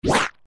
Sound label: sellection button sound effect; Sound-Graph